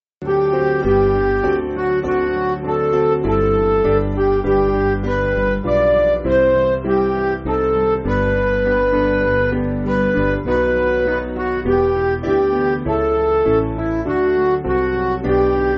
Midi, Music Backing

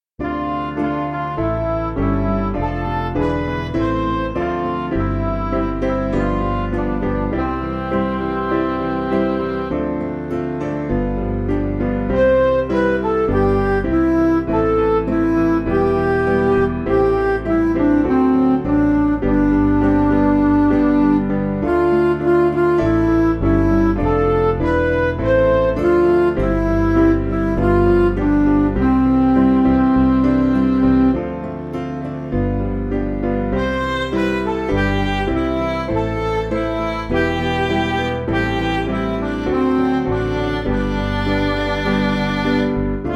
Music Backing Files for Churches

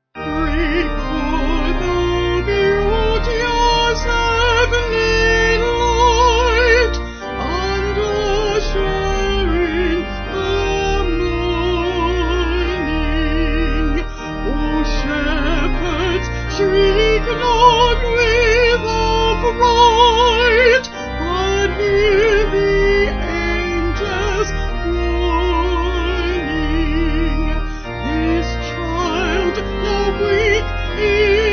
Vocal Organ; Vocals and Organ; Sung Lyrics

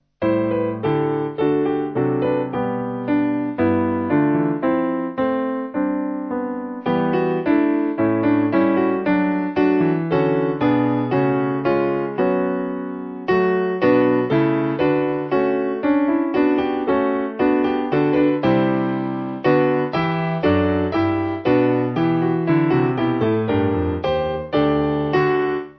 piano

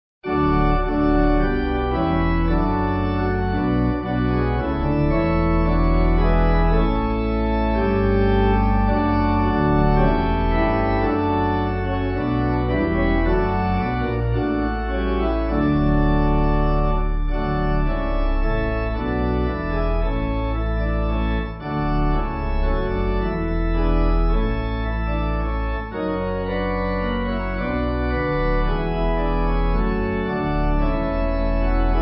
Pipe

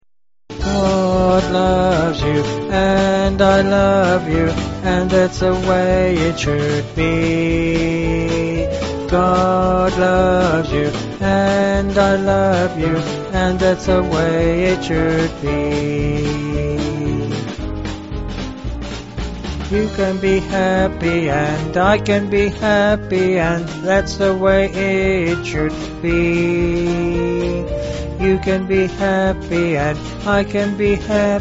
Piano, Band, Pipe Organ

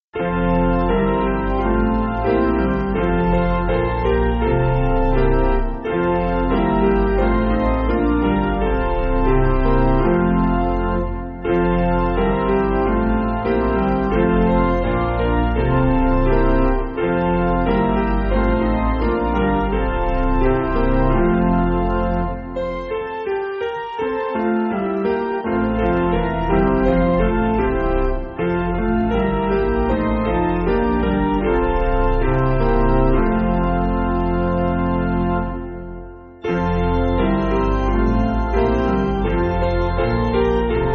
Piano, Backing Files for Churches

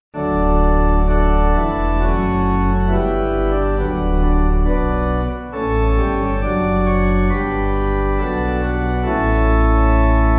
Pipe; For Thy Blest Saints; Dunlap's Creek; Dunlap's Creek 8.6.8.6

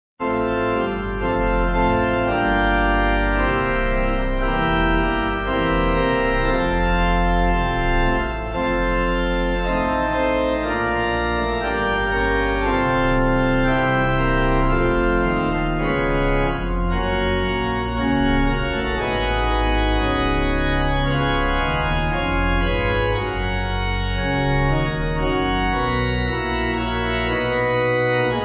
Pipe

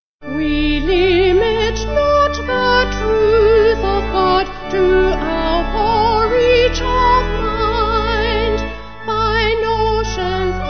Vocal Organ

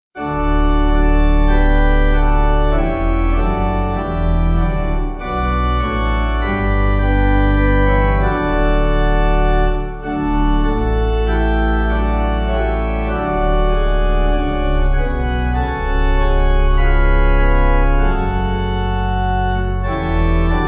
Pipe, Pipe Organ

